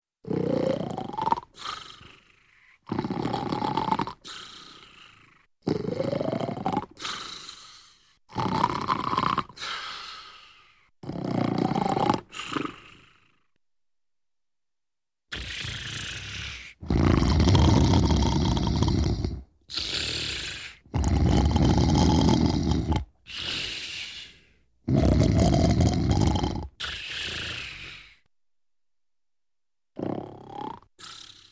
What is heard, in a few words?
Man Snoring; Hollywood Edge Cartoon Trax